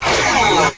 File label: weapon; armada2